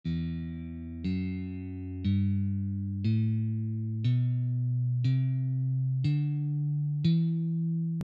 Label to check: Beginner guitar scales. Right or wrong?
Right